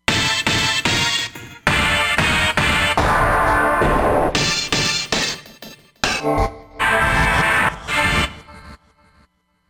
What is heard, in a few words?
Stab Dance, Roland Fantom XR